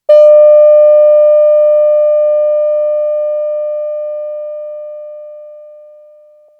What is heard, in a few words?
vca with fast attack sine wave, analog synth; analog synth 101